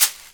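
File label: rainstick, percussion; ILIO; Synclavier Percussion 2; World & Orchestral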